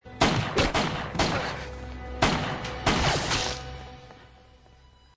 burst of gunfire

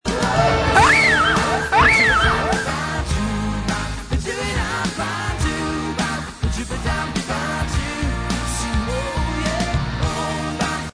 scream

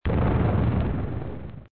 explosion

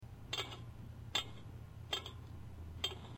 clack clack clack

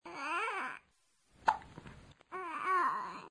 baby burp

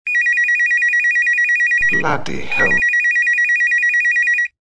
bloody telephone

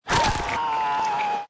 scream

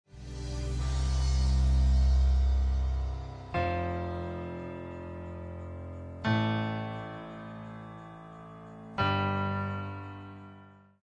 piano

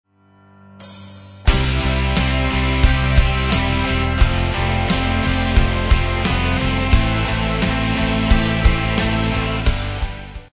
instrumental

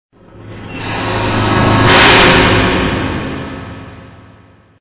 vault door

